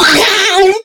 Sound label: pain; awesomenauts; Commander Rocket